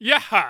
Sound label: Cheer; Osfala; zelda